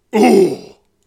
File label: damage; DragonSpirit; KickSurvivors